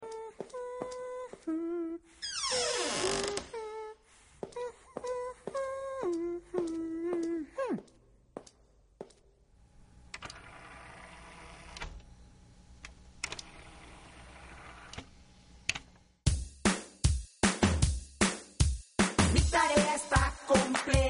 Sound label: pipe